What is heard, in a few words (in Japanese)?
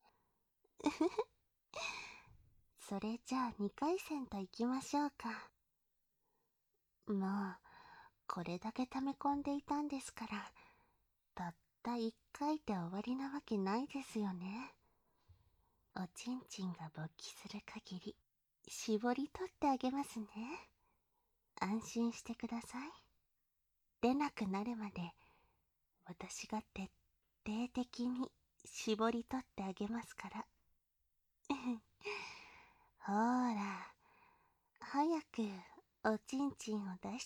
腰にまたがり喘ぐナース